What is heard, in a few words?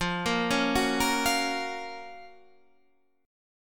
Gb Major 7th F, guitar